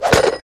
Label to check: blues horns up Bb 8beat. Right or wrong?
Wrong